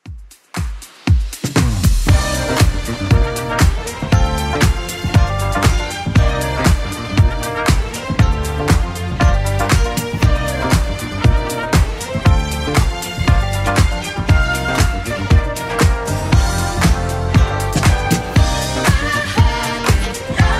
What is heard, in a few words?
80s Club Edit 118bpm